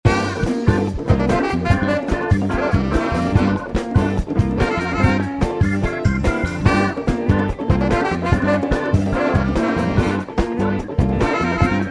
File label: Instrumental, live